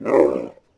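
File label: Camel Fidget Vox; Civ4 warlords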